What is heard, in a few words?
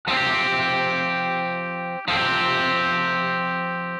Crunch